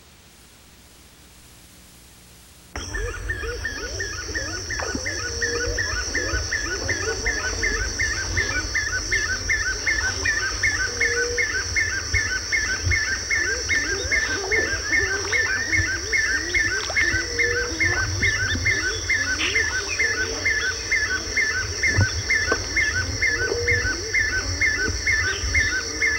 capped heron

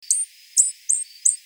vervain hummingbird

